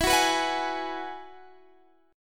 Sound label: Ab Minor 7th Sharp 5th